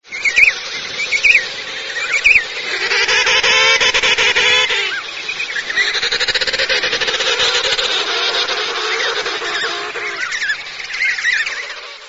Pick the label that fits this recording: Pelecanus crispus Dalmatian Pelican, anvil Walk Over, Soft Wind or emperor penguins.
emperor penguins